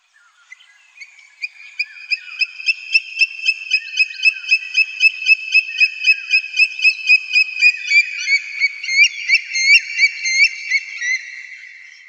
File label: Great stone curlew; چاخ لق هندی